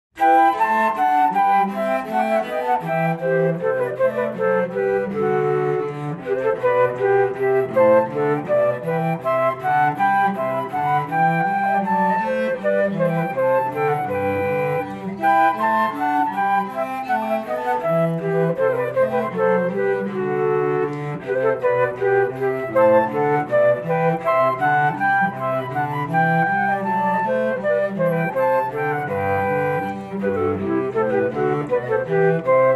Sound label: Bouree